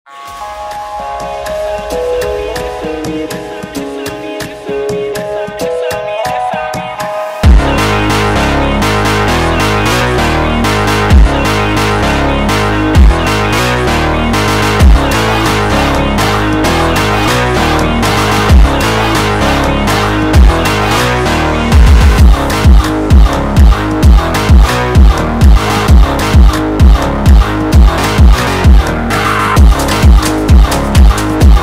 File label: saxo funk